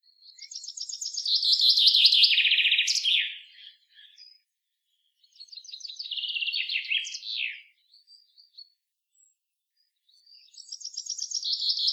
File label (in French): chant pinson des arbres; oiseaux